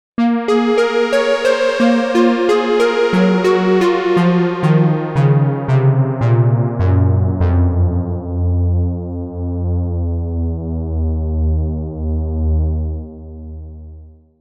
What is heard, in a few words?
48kHz Single Sample Raw Chorus; EIII